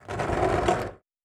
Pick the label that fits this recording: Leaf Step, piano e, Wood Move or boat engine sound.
Wood Move